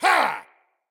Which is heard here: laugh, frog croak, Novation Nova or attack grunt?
attack grunt